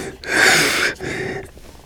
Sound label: launch moan; hl2 ep2; outland 12a; eli